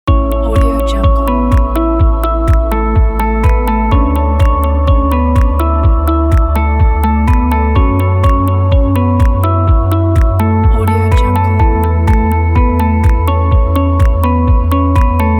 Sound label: uplifting ambient loop; hotloops